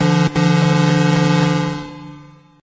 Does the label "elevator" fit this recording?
Yes